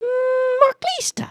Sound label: excited, emotes